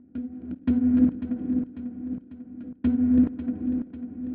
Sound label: 110 Organic, Percussion; Touch Loops; ElementsOne; Abstract Rhythms